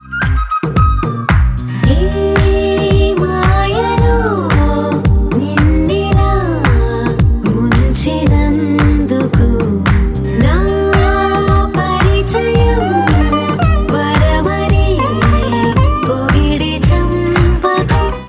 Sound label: Female Ringtone